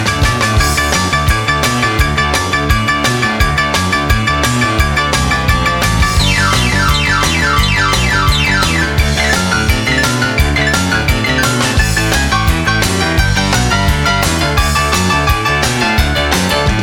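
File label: rock n roll ringtone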